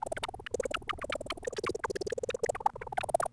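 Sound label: chatter loop, droids robots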